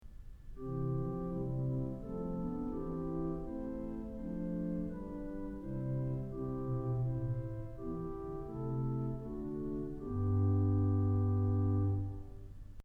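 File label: Choir Concert Flute; Kellogg Auditorium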